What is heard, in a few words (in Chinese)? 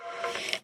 待机声; 流浪者